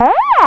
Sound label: wow squeal; Weird and Interesting Hits; single hits